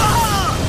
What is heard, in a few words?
screaming; shulk